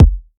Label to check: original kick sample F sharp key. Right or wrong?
Right